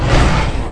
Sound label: fall; quake2; makuta